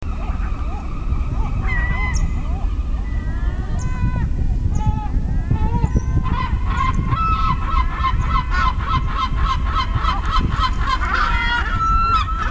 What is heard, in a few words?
L mong long call, Habartuy